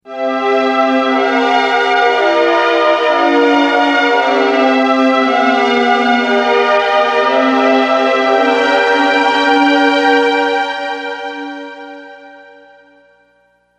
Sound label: Progression, chord progressions